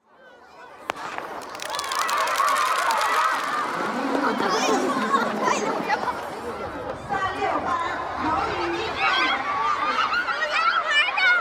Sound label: ambience